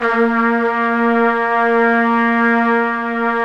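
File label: BRS TPTS, brass trumpets section mp; Roland L-CDX-03 Disk 2; BRS Tps Sec.1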